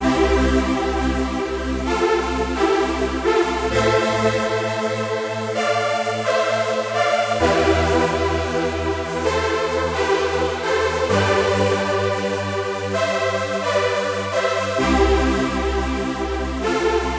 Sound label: Analogue Strings